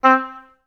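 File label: oboes staccato C3, orchestra; OBS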